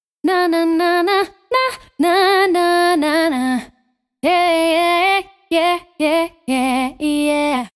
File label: vocal; Oculus